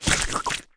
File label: Lick